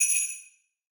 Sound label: sleigh bell